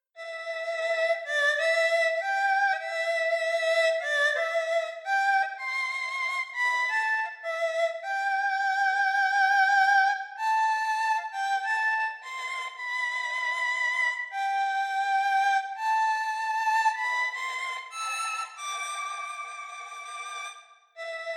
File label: Erhu